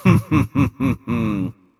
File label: laughter short